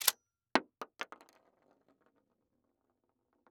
Weapon Bullet Shell 12 Gauge Eject Shells On Wood Floor; AdobeCC